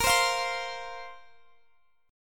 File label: F Suspended 4th A sharp